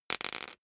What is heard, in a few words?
poker chip fall wobble short